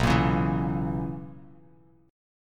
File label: B Major 7th Suspended 2nd Suspended 4th B Bass Standard; strummed; 7,13,11,9; JGuitar